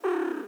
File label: cat purr